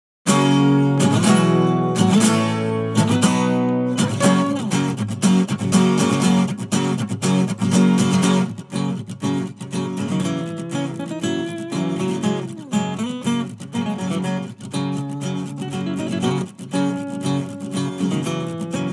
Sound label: Acoustic